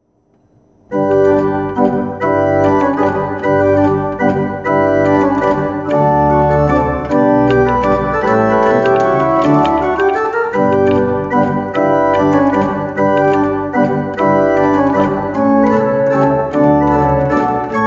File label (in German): Mazurka